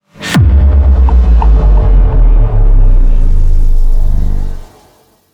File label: archeology event bad omen; ancient relics